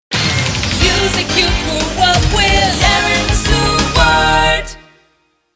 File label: epic, sung jingle with music